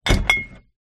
household trashcan recycle glass bottle throw in on other rubbish